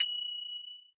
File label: magic